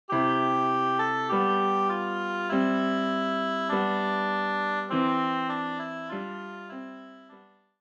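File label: oboe; Alle Jahre wieder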